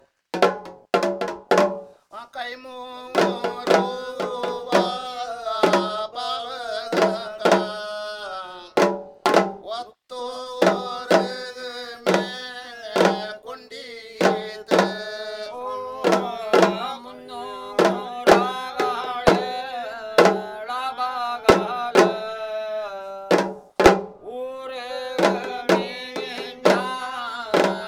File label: Puls sound example; Narimangala Pat excerpt